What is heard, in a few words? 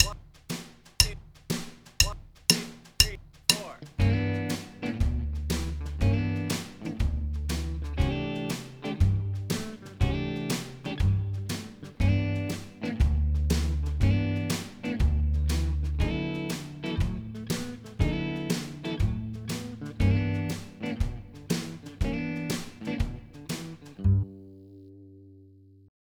enclosures, backing tracks; country guitar solo ideas